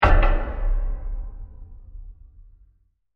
Massive Wood Hit; Burst; Impacts; Crafted Signals

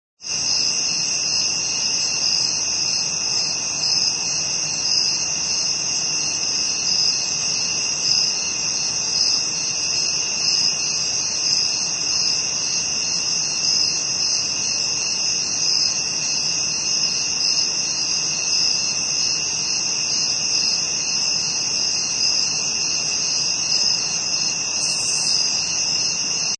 Night Insects; Insect sounds; Animals

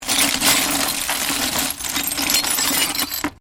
Glass shards movement

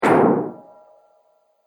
Distant Impact; Distant Ko Impact; Miscellaneous Impact Sound Effects; Andrew Fleming

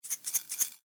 Food Salt Shaker Shake; Household

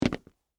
Dress Shoes Jump, Concrete; Footsteps; Human; BlastWave

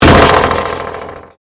Convolution Pulse

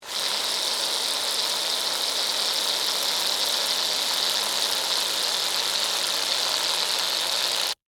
Whip Cream Spray, Long, Steady; Household; Food; BlastWave

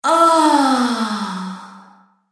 Femme Fatale Moaning With Reverb; Sex Sound Effects

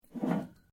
METAL GIRDIR MOVE; Materials

